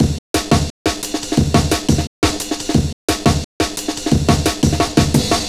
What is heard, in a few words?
breakcore breaks